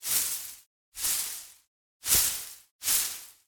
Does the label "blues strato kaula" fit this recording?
No